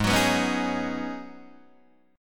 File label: Ab Minor 11th, Ab, Guitar, DADGAD